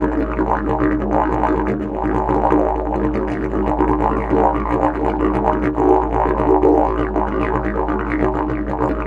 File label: A BURBLE, Didgeridoo; Big Fish Audio - Didgeridoo and Other Primitive Instruments; BURBLDRONE